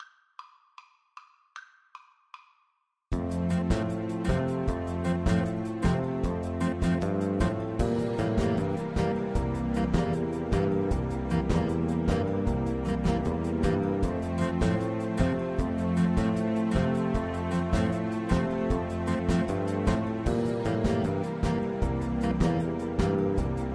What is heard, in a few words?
la cucaracha, Trombone